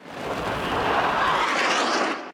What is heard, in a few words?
damage hits explosions, Warships